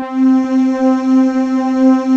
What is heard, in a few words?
NEW AGE VOX, JV PADS; Pro-Rec - Synthsonic